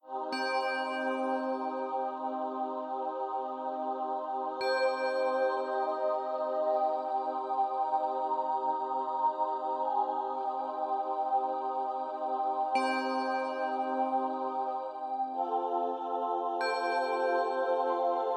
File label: shrine